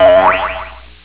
twaaaap

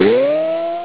boooiiinnng

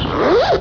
zip

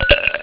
ice

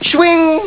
shwing

